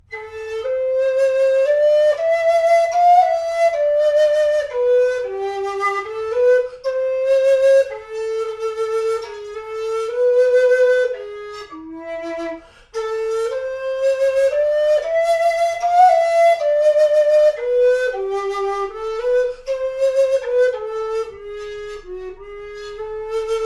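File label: green sleeves tenor, recorder; Tategoto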